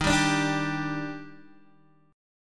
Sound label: Eb Major 7th, Guitar DADGAD; down